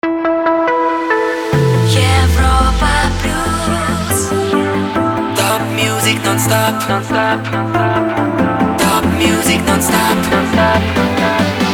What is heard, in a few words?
Jingle Main ID; Europa Plus